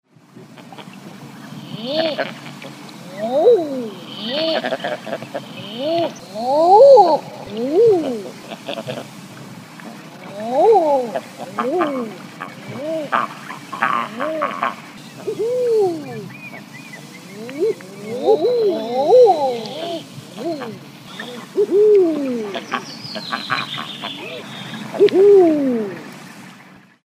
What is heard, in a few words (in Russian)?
obyknovennaya gaga golos